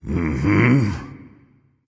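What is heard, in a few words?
nonverbal agree; smite; voicepack; ullr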